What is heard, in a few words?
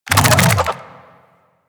Infested Big Ashen Move Start; Warframe